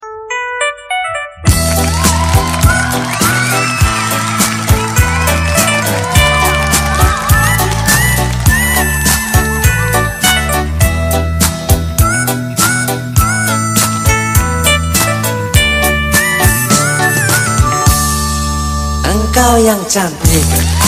Bai hat

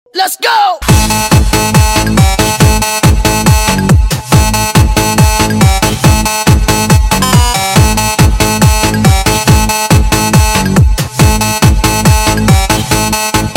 Nhac chuong

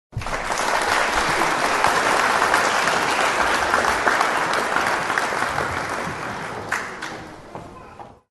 Tieng vo tay tan thuong

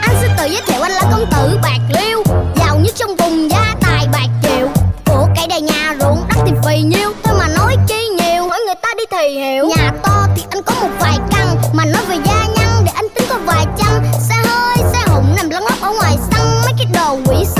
Bai hat Cau be hat

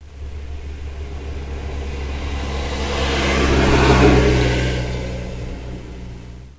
Tieng xe oto chay

